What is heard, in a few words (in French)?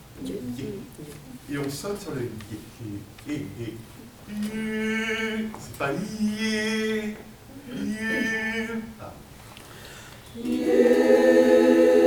Sopranes, Mezzo et Alti, ie à la russe; Les Vêpres